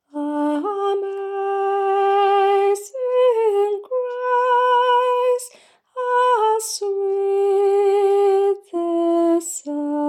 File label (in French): Amazing Grace soprano